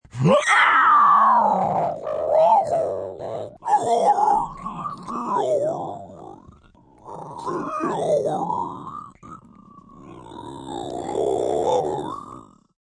Aliens Roaring, Alien, roaring, angry, scary, long (human voice)